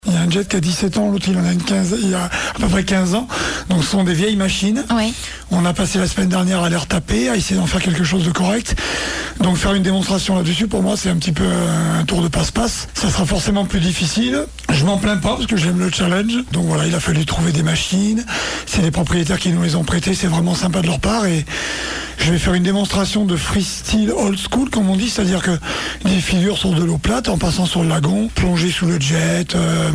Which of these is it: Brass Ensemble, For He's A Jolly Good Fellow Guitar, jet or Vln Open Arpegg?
jet